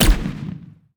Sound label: sfx skill, ZombieSkill SFX; IdleRPG2024